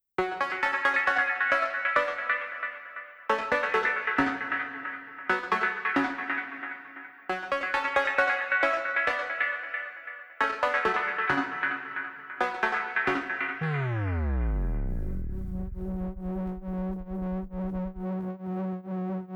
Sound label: Duppy Steppin Light Version, dub